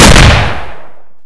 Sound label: deagle; brainbread